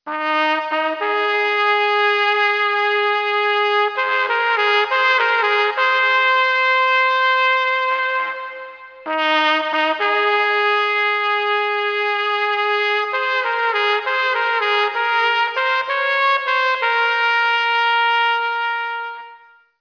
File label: Hejnal